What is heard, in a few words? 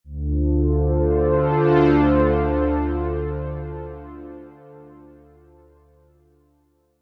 synth chorus classic